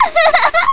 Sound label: Girl Laugh; Girls laughing